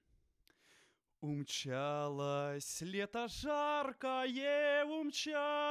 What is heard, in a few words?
vocal